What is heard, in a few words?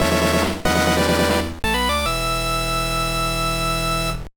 Pattern Clear BGM; contra advance the alien wars ex; gameboy advance